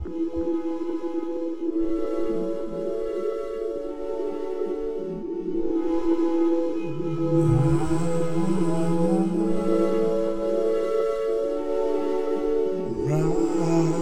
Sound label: 8bit style